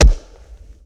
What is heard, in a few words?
Mega Body Punch, Hand To Hand Combat; Sony Pictures Sound Effects Series Volumes 1-10; Sony Creative Software